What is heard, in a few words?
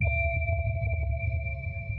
sonar Ping Suit Medium; Barotrauma; Real Sonar